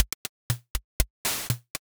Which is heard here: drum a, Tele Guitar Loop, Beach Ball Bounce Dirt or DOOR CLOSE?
drum a